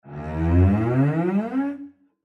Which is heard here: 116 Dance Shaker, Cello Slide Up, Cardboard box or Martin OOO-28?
Cello Slide Up